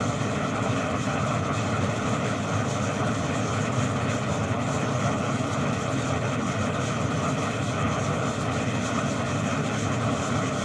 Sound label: SSN; CLUE; 22kHz; joergensen2011